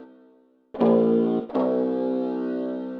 ETHNO 160 C

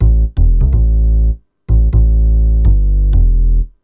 DEEPHOUSE BASS 125 A

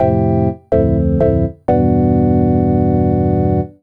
DEEPHOUSE ORGAN 125 A

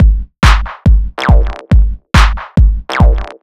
TECHNO BEATS 140

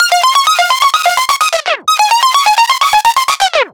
synth 128 Cm; Anthemic; synth loops

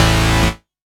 KEY SHOT TEKNO 140 A; Techno2; Singleshots

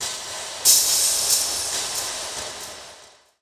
TECHNO FX 140

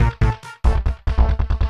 SNTH BASS ELCTR 140 A, Techno

